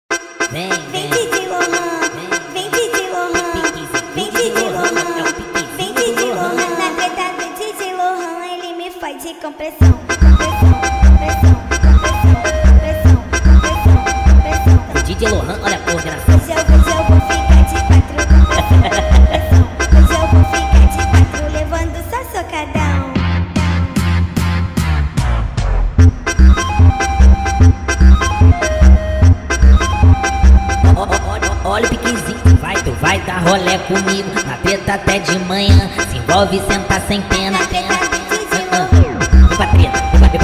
sped up reverb

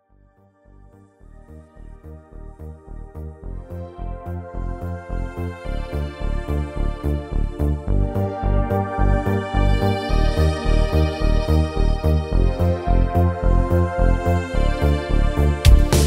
80s italo disco, korg pa4x

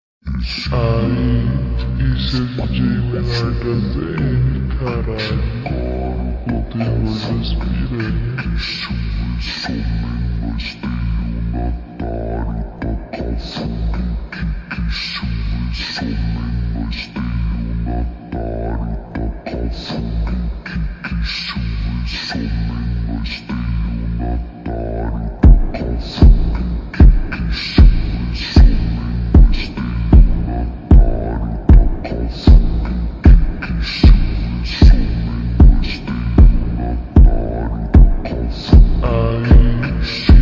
beat slowed

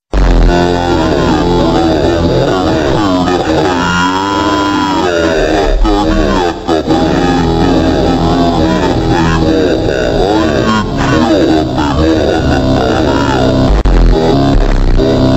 Japanese remix slowed reverb

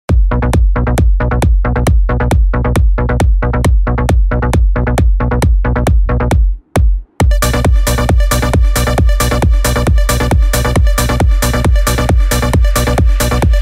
summer shuffle dance

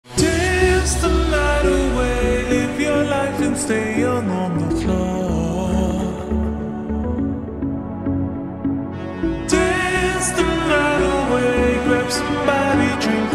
loop slowed reverb

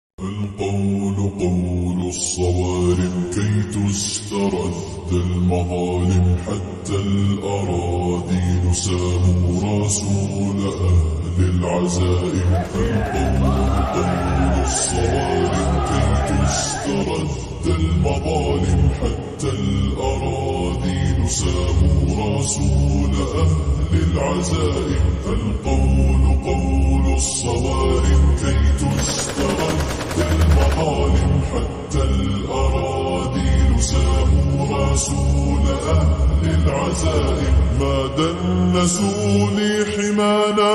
slowed reverb with soundeffects

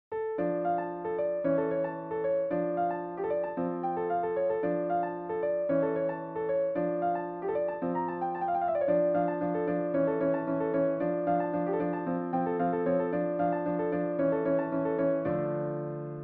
piano cover

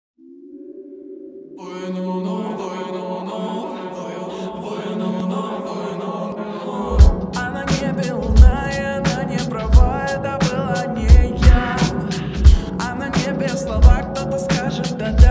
reverb bass boosted

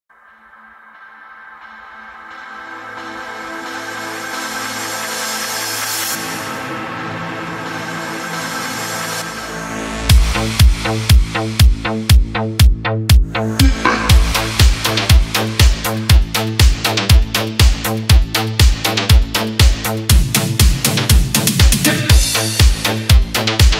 shuffle dance

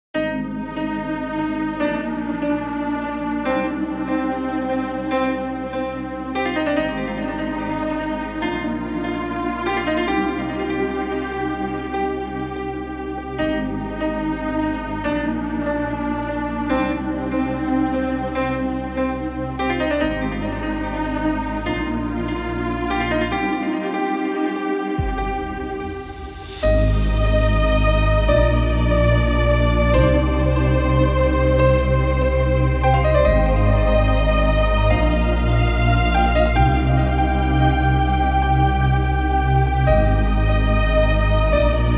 techno version

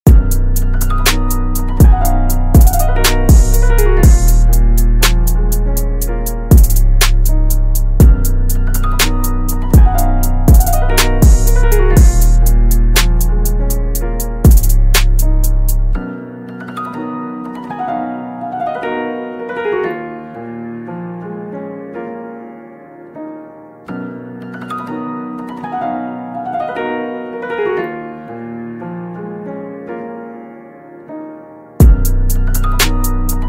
instrumental

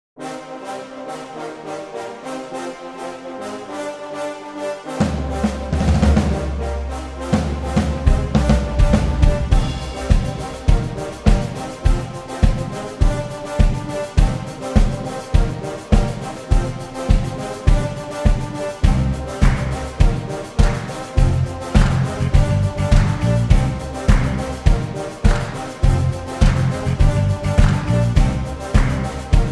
piano fantasia orchestral remix

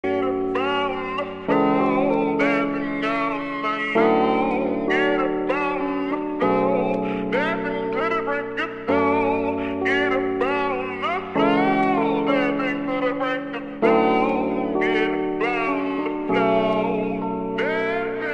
slowed reverb

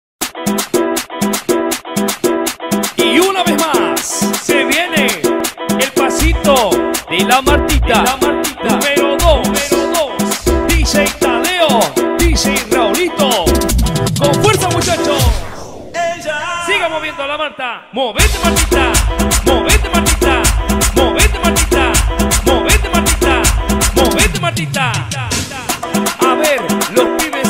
dj song drop edm

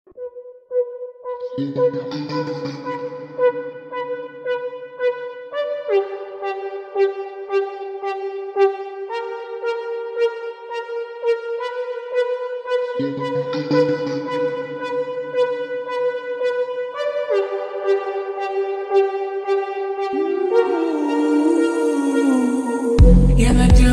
slowed reverb 8d

